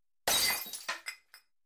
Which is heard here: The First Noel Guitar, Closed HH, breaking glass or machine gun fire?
breaking glass